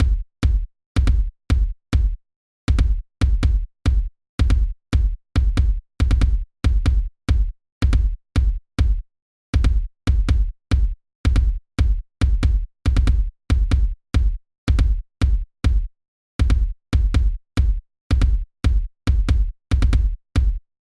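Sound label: gloomy and atmospheric music composition characterized by soundscape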